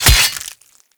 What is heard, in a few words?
Melee Axe Impact Flesh